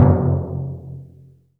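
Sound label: orchestra bass drum; Greytsounds VOL-1; ORCH PERC 1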